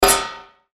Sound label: HIT METALLIC; Multimedia; Blastwave